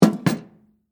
Tool Box Set Down, Industry, Tool, Box, Set, Down, Metal, Case, Antique; Hand Tool